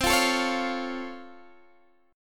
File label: Db Augmented Major 7th, C